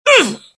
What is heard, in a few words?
death, pain; mohaa; dialogue